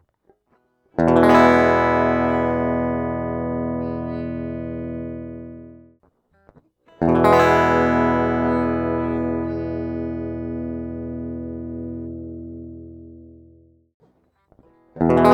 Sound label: guitar cable comp